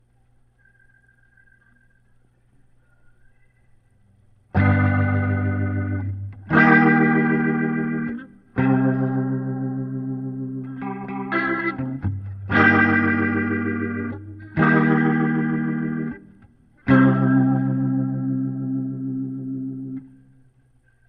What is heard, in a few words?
Leslie gtr